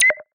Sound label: Funny; Pantech Discover P9090; FunnySet